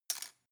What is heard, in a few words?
cutlery plastic drawer place